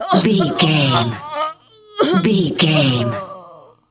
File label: voice female death torture